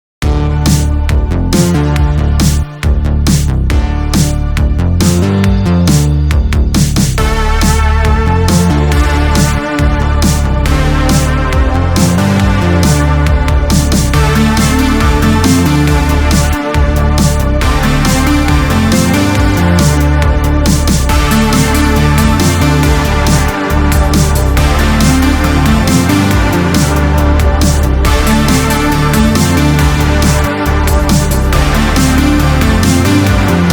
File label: Synthwave; Pony Music Archive 21.03